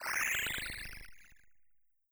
neural scanner; meat madness redux; godot

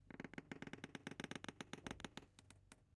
tentacle creak; meat madness redux; godot

